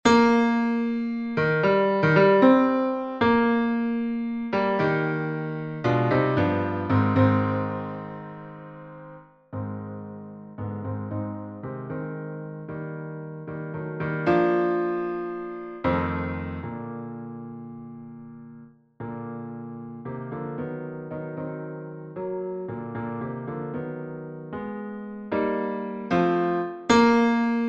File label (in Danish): 2. bas fremhaevet, mandskor